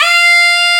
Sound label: Mizmar F4; Planet Earth; Digital Sound Factory Planet Earth